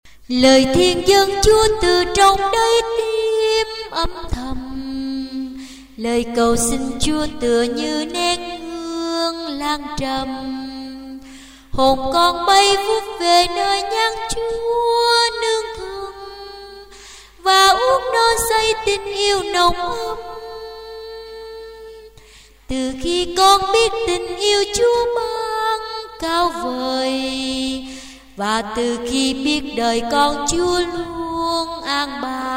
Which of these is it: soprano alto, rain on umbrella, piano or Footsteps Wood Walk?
soprano alto